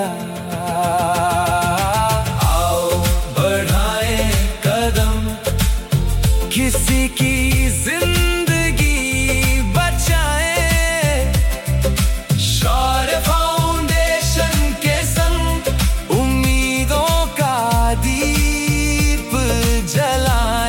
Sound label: Blood Donation Song; corporate